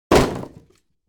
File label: Simple wood hit impact